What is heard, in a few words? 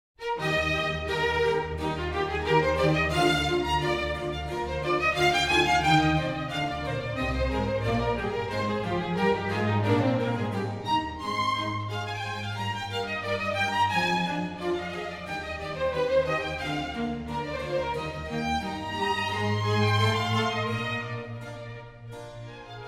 Concerto in E Flat Major for Horn, Strings and Continuo - Allegro; Baroque.horn